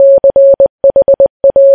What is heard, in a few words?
morse 茶